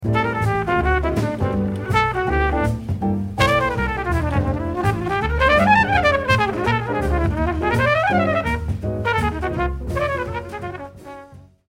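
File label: brass solo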